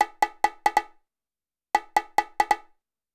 CONGA, Techno 138 BPM; Best Service ProSamples vol.54; AKAI

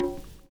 PERCURT; Univers Sons - Kitch 70s

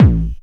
KICK deep, Techno Trance DRUMS

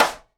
SIDE STICK, Live Snares

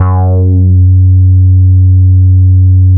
FUZZ F#1; E-MU Producer Series Vol. 6 – Analogia Project; Strictly Synthe

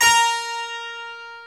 Harpsichord; E-MU Producer Series Vol. 2 – More Studio Essentials; Keyboards

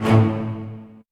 string; Techno Trance Essentials

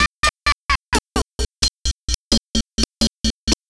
Noise Pattern; Sonic Foundry (Sony Creative Software); Hydroponic Hip-Hop

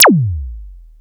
POLYMOD; AMG - Vince Clarke Lucky Bastard

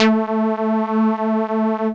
lead syn, Techno Trance; Ueberschall; Techno Trance Essentials; SYNTH

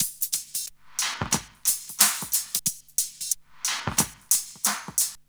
Smooth, R&B Drums

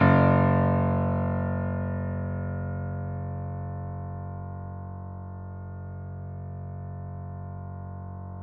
piano; East West; The Ultimate Piano Collection

